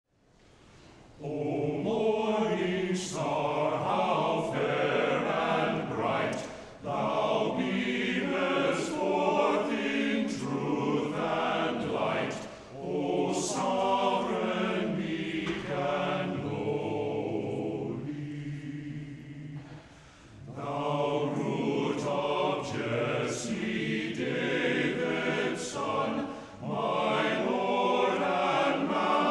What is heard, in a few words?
choir; epiphany music